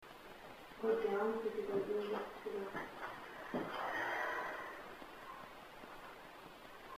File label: breath